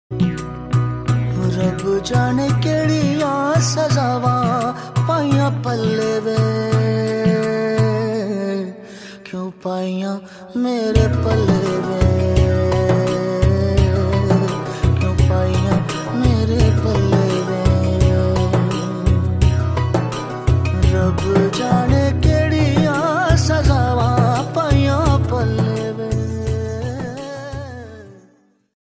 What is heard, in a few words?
punjabi ringtone